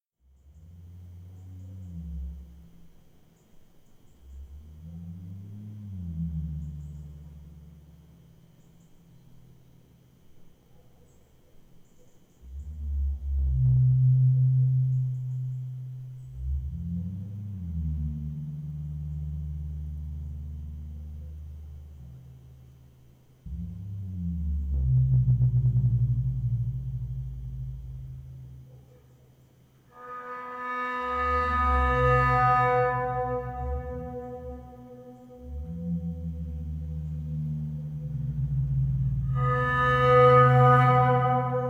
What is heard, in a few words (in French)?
Tambour ocean; Ivanphone